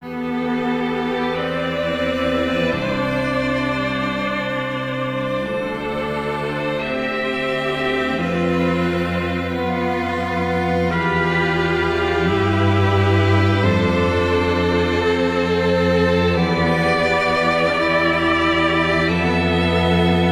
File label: Strings